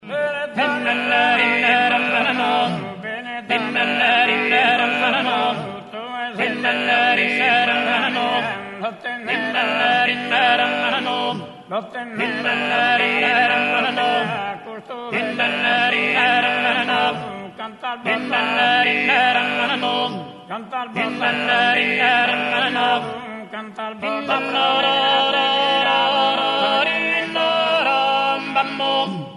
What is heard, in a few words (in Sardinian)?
tutti